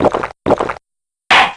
chug slam

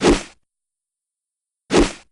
pose old; tinpillar

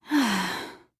sigh

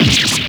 stab

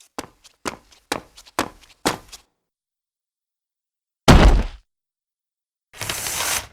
carriage enter full

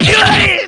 damage; hazama

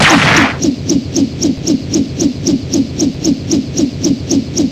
hover damage; armie

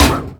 laugh; aura

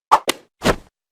point

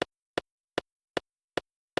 clap; gant

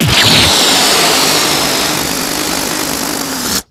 deflate

